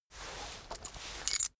key ring mid size pocket fetch

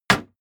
block wood

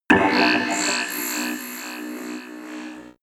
mechanic alien

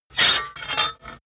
sword cut hard light fast

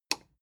plastic button small simple push

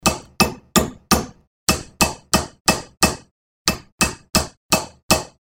hammering

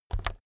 keyboard external key press fast soft

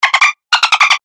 dolphin cirps

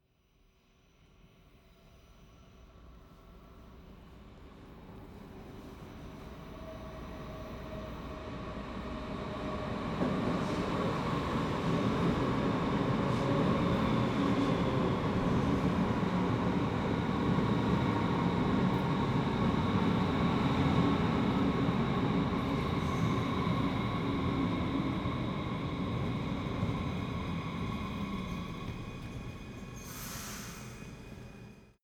train underground station arrive

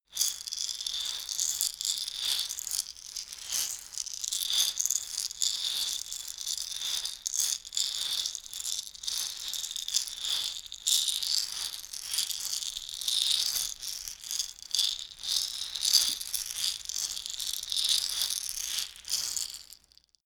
shaker wood constant rustle

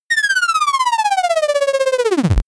scoring

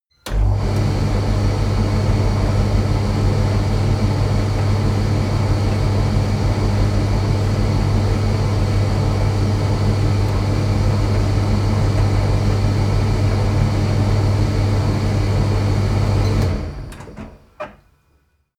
washing machine tumble drier work

